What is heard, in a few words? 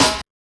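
snare drum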